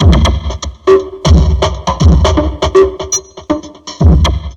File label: Drum Loops Crazy Processed